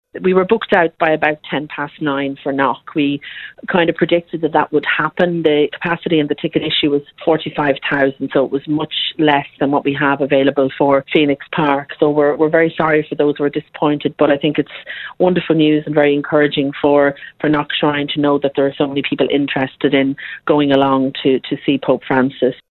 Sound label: drumm